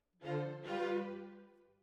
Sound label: Violas, jazz for piano